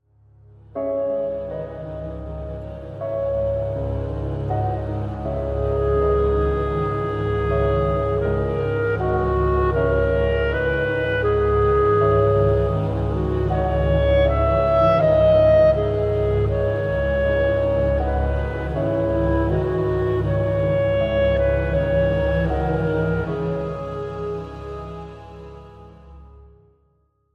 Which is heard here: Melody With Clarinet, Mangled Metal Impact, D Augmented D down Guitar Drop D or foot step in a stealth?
Melody With Clarinet